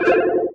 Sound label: Retro FX; Cymatics; Video Game Sample Pack Vol 1